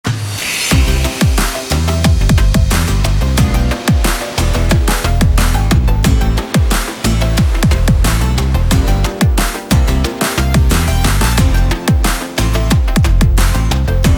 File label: Battle Slow Normal; Lycan Sanctuary